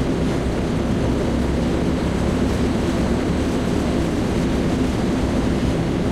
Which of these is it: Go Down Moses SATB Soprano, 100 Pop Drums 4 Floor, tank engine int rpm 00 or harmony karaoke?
tank engine int rpm 00